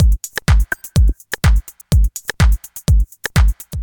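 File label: Drum loop 125 16ths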